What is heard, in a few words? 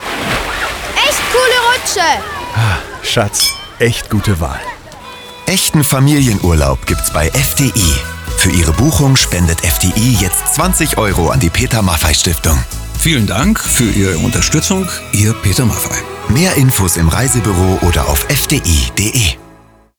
Funk; TABALUGA